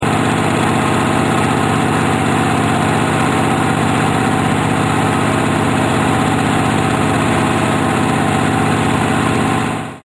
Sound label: Motor Hum